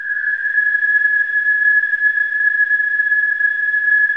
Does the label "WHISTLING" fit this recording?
Yes